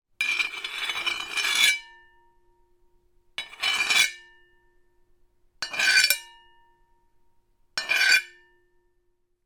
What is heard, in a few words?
foley metal med large scrapes